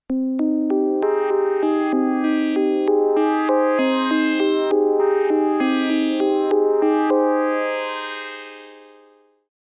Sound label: microfreak V4 Presets